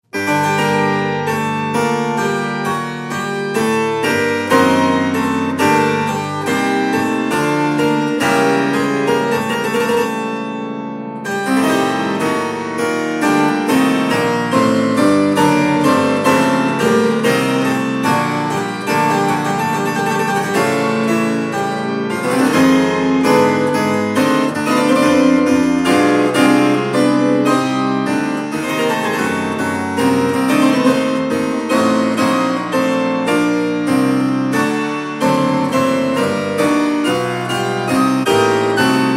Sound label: Hpschd Bass; BACH732; Passus